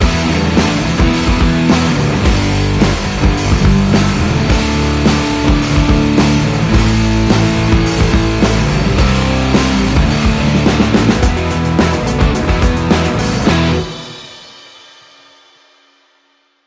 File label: Bumper